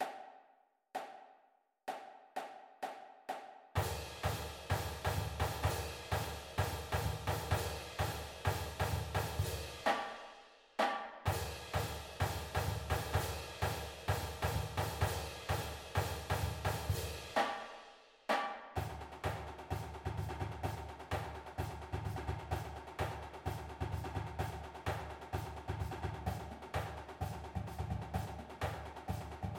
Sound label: shots, drumline